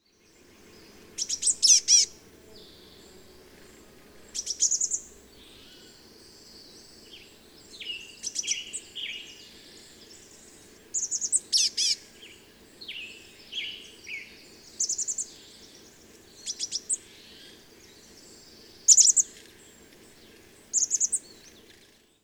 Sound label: Chestnut-backed Chickadee